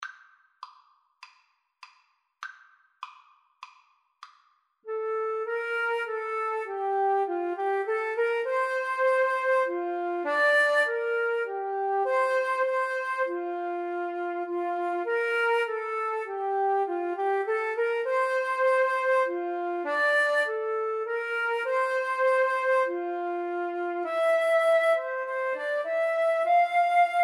flute duet

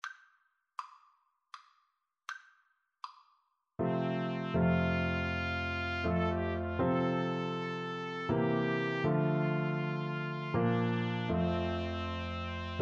trumpet duet

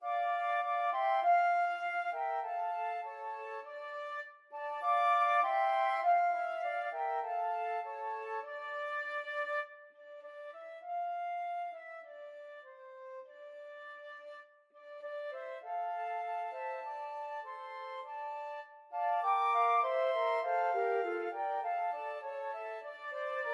crown roses, flute

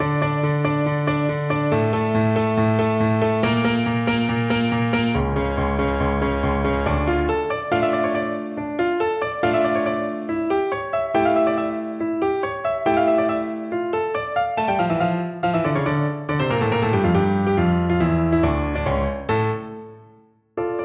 piano

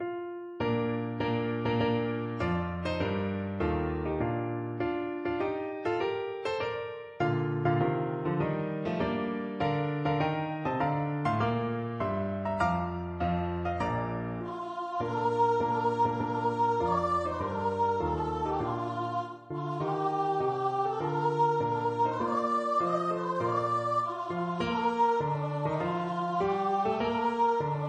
heart of oak VOICE, unison choir

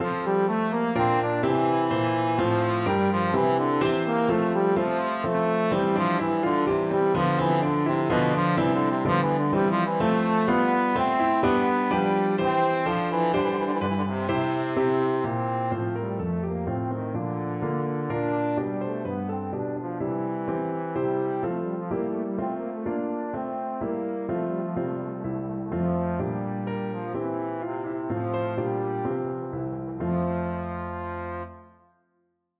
sonata, trombone

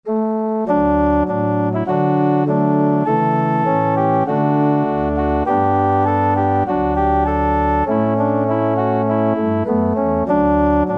organ

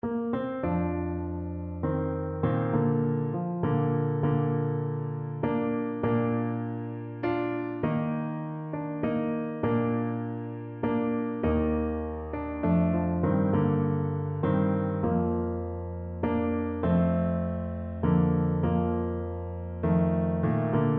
Shenandoah, Bassoon

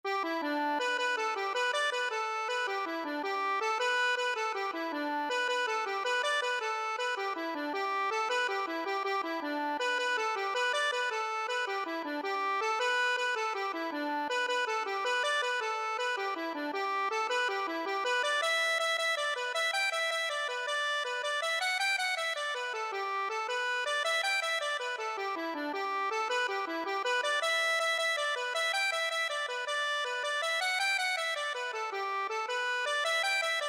accordion

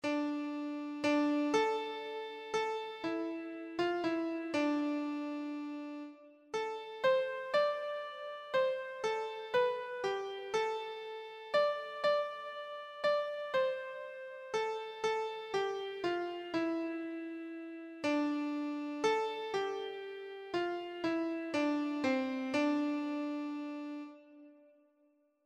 scarborough fair, keyboard

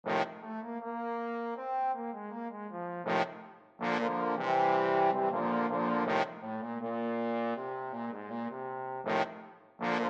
trombone quartet

